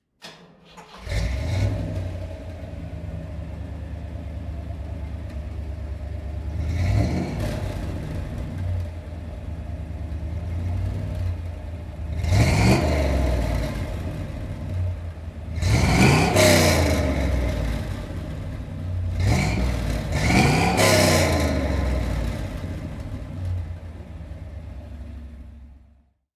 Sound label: Chevrolet Corvette Stingray C3